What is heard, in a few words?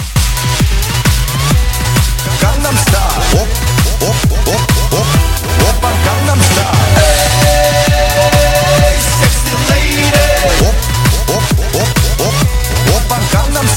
Duet